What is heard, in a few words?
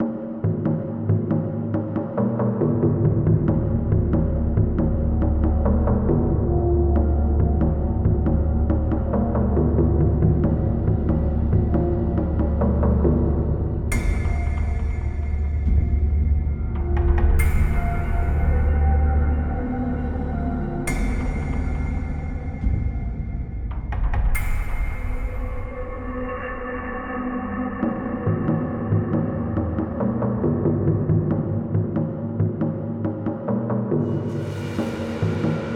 Action Loop